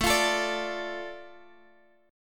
A Suspended 4th Sharp 5th down Guitar Half Step Down